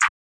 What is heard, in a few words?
muffled clap; drumkits; fl studio; maily plugnb